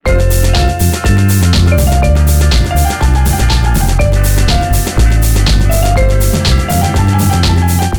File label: Fun Samba